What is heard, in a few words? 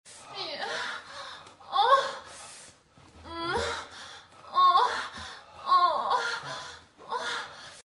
sex, moans; The Witcher Hunt